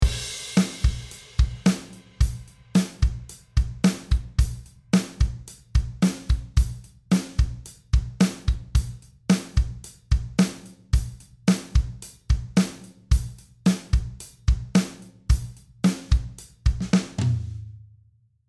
drums porta toilet; audio ease altiverb 8 xl; dixie

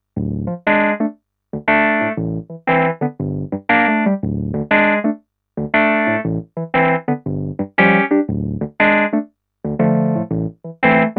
Casio CDP S360 Crunch E Piano

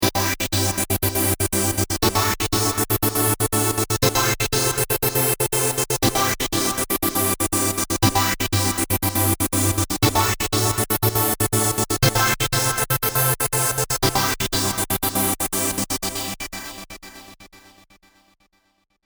trancegate normal

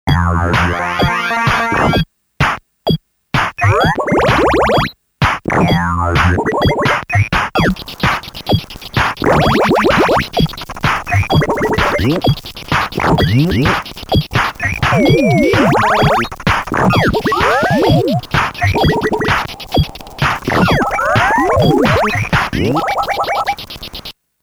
Talking Synth

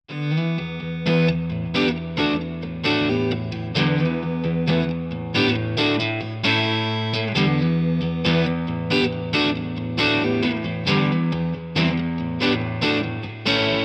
Amp Only Clean Reverb; Archetype Tom Morello

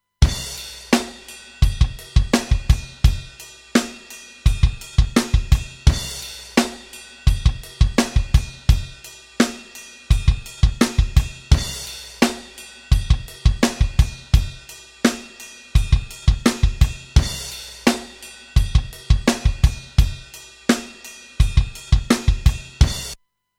Mastering Limiter V2 Drums Saturation; Bettermaker

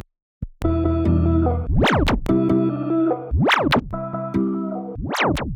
Beat Breaker; Logic Pro 10.8

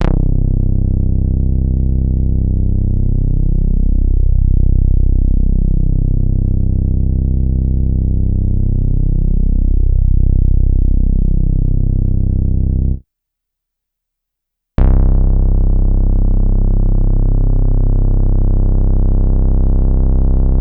Behringer Toro; Grandmother; SCI ProOne

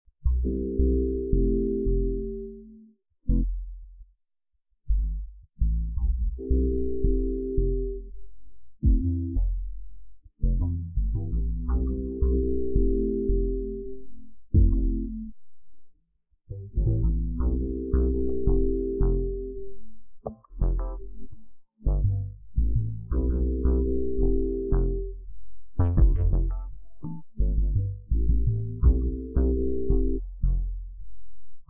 Bass

